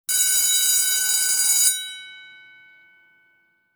School Bell; Mean Girls; Rehearsal Tracks

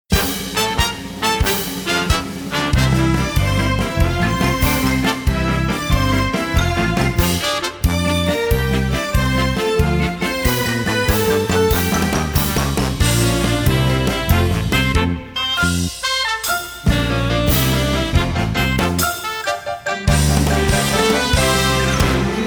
Exit Music; Winnie the Pooh; NYTE Tracks

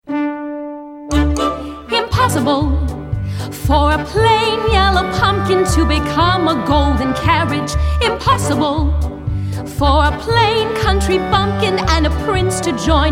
Guided Vocals; Cinderella

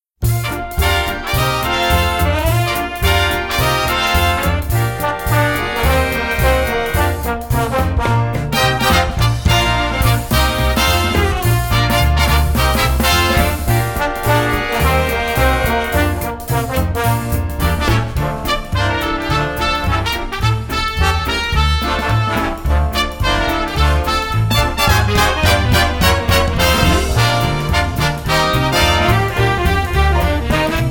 Exit Music; 42nd Street; Rehearsal Tracks

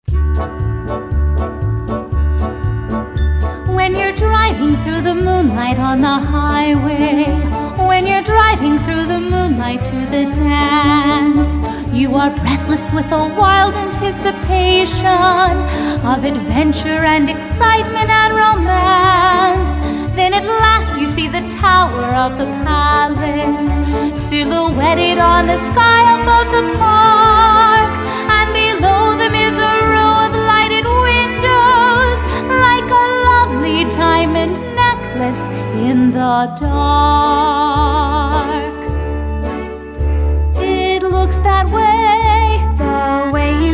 Guided Vocals; Cinderella

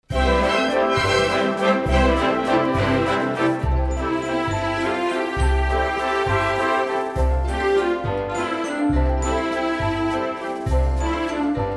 Waltz Underscore Guided Vocals; Cinderella; Rehearsal Tracks

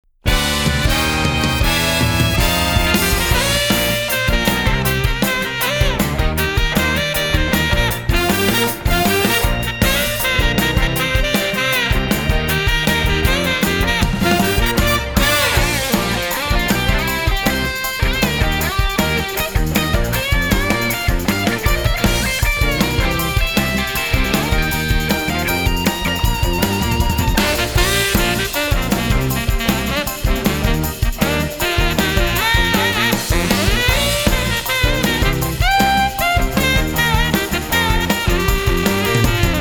Exit Music; Mean Girls; Rehearsal Tracks

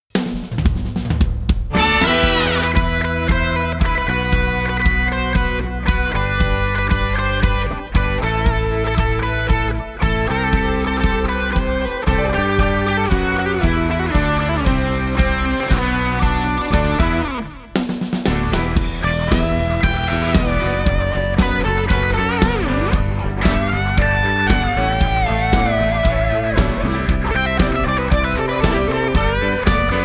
Exit Music

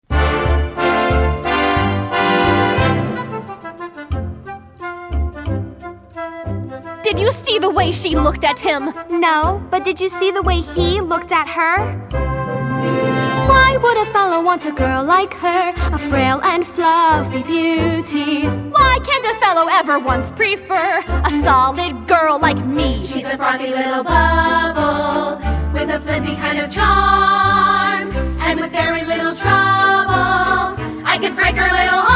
Guided Vocals; Cinderella

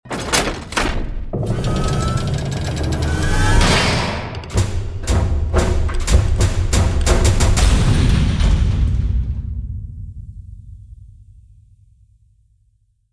Lever

